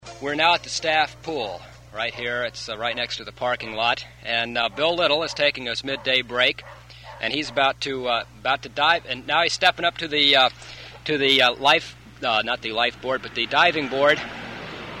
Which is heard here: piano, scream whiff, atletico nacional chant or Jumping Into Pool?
Jumping Into Pool